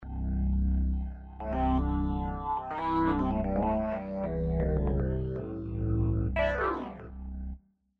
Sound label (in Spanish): voc organ hammond